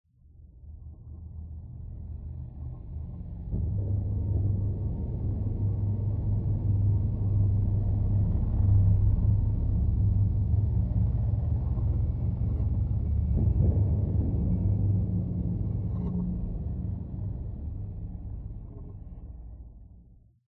Ambiance Flooded Pad

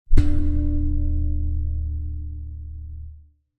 Tempo Drum Hit; Mountain SFX

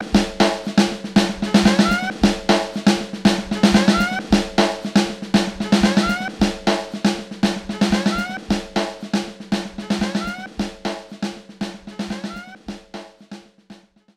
Frog Music Streamed, Mansion Escape Intro

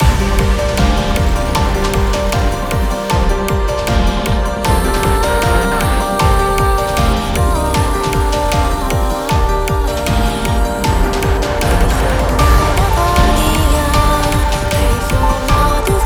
Firmament Active loop; PerituneMaterial Firmament3

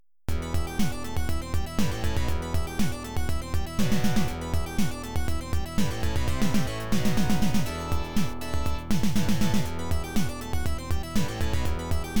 Jackpot Timer

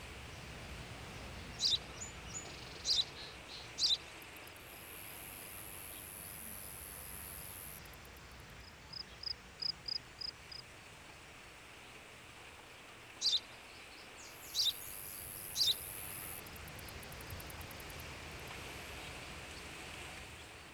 Ambient Field Loop

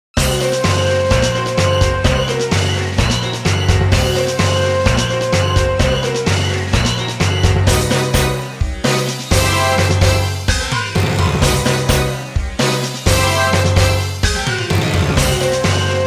Music Success event

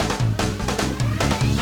music park intro

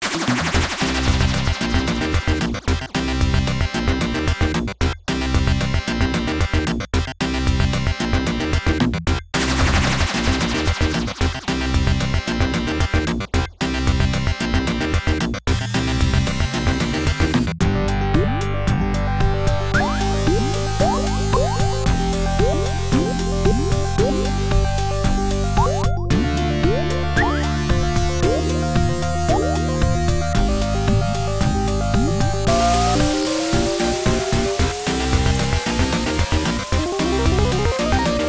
High Score Entry; 720 Degrees; atari system 2

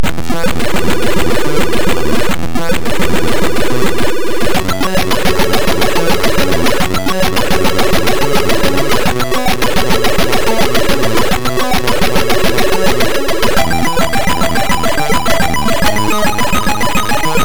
Level 1 Boss; Jim Power in Mutant Planet; Atari ST

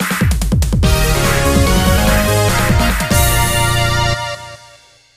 Game Start; dark witch music episode rudymical

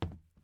plastic step

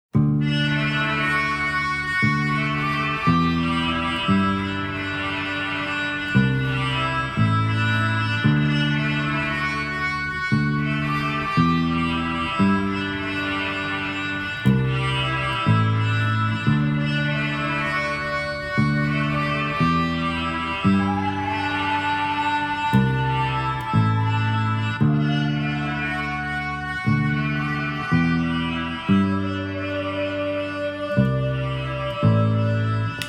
Ghost Synth; rainbow roads a queer tribute to video game music; Stardew Valley

